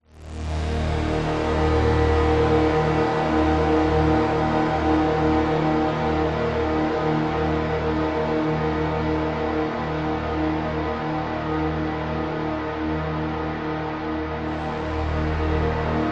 sound design drone synth dark sinister long evolving